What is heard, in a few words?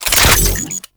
Reload, Weapons; Warframe; Corpus; Tenet Quanta